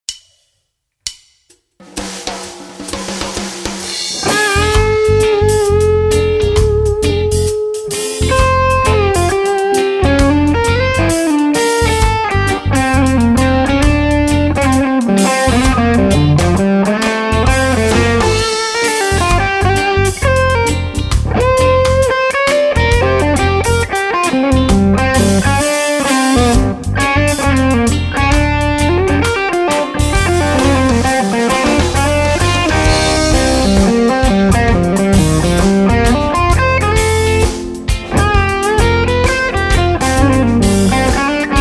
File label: Reggae